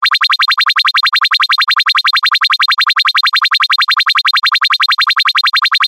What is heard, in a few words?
science fiction alarm fast high pitched; zapsplat